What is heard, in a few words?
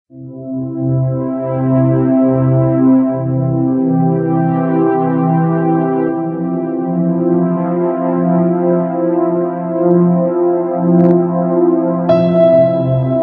slow motion; ambience